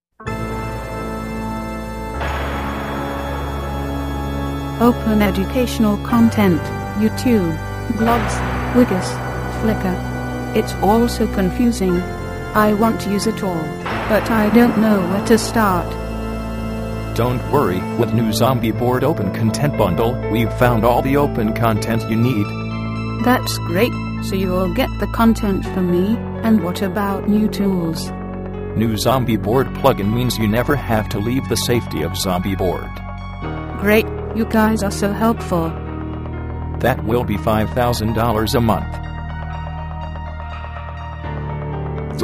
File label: zombie